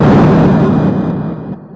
explo, video games; xevious

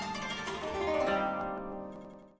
shamisen strumdown; Far East Drones and Plucks

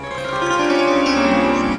Puriya Swar; Far East Drones and Plucks

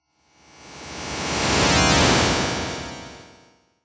metasynth flyby; Various Strange Sounds and Effects

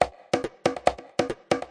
140 bpm peppy bongo

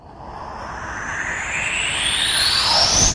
Electro FX; Ueb HE Electro FX

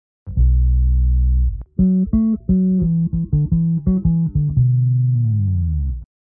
bass; REMIXABLE COLLECTIONS

